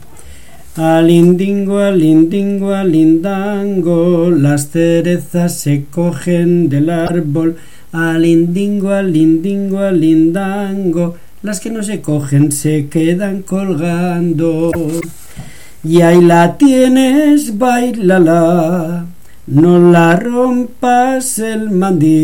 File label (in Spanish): Pupurri